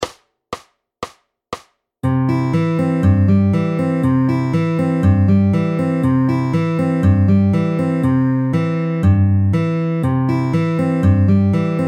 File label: All In Betweens FAST, fingerpicking